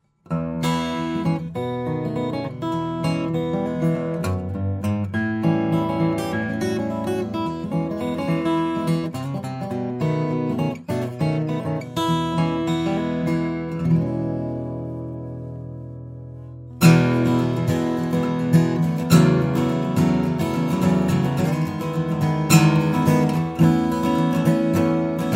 eichelbaum grand auditorium, steel-string flattop guitar; acoustic electric